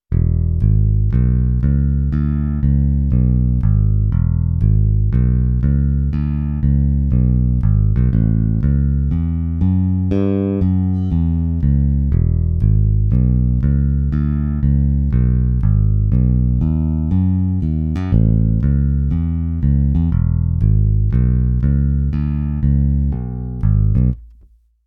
Bass; AmpleSound; P 2 Lite

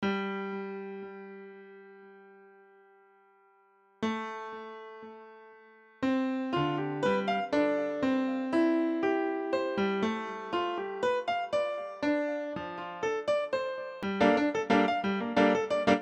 Piano Jazz Cycle

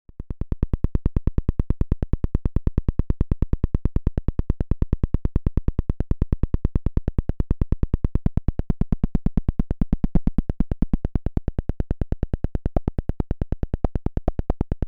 Rhythm; KASTLE

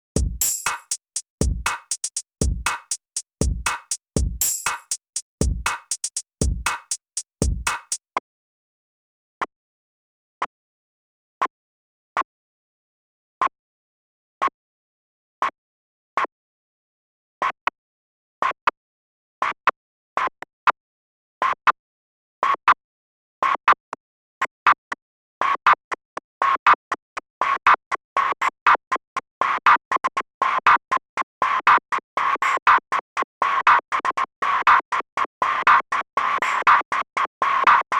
External Signal Processor Drumloop; CHERRY AUDIO; PS-2

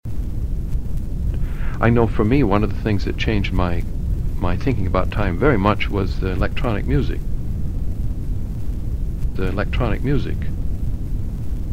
Noise; RX2 Test

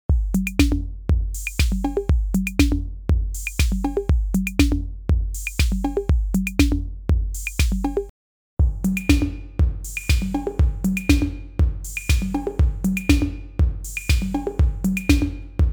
Raummaschine Drum Loop; TEGELER

